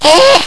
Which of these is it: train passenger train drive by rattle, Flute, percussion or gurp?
gurp